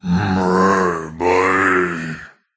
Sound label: Cowboy Groan